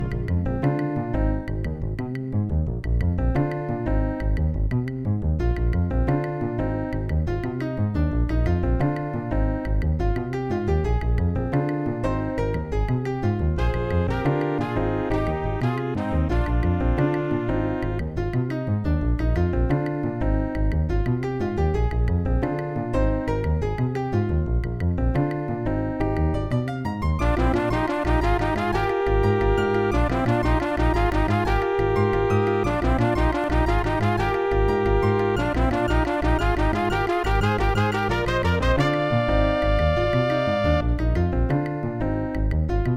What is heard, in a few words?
roland lapc gm; Roland LAPC-I (GM emulation); soundcard test; Hocus Pocus